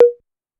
Tom 808 Hi, Clavia DDrum-AT, Pitched Up

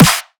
drum snare crashing; Virtual Riot; Drum Hits

